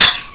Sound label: combat; openrsc